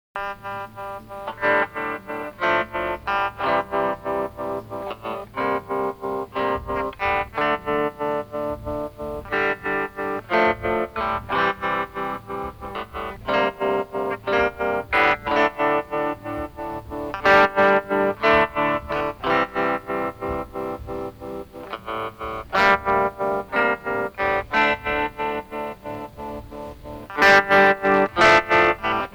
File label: Instrumental